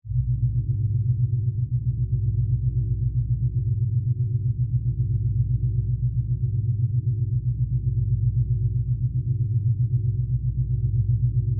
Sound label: Drone Spaceship, Interior, Hallway, Power, Source, Flutter, Scifi; Science, Fiction; Spacecraft; BlastWave